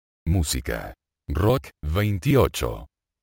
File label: rock